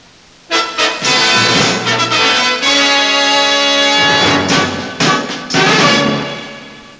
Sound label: dramatic